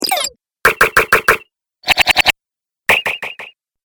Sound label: Sci fi Activation Sounds; Science Fiction Sound Effects